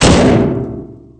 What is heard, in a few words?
body fall metal; Spearhead Demo; MacFormat 2003 June